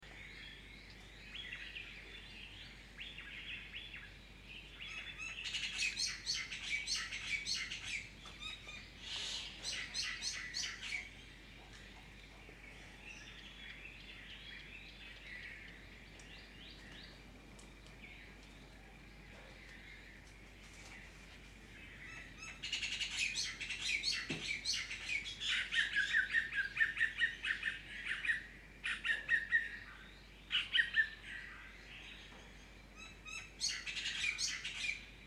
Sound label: birds